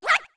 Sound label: Shout; Deku Link's shouts when throwing Deku Nuts